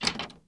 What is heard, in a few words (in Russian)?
dyorganie dveri, doors; FEAR